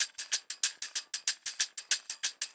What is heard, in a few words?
Tambourine, Trip-Hop Loops; Rondo Brothers; Sing Into The Machine; Sonic Foundry (Sony Creative Software)